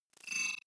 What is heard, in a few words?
sci-fi expand slide tonal; sweeps-swells